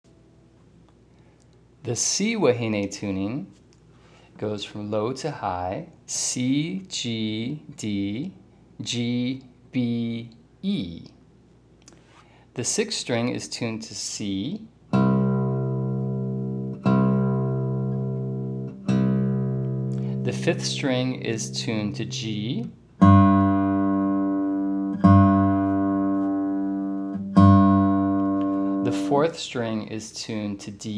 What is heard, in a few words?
C Wahine tuning, guitar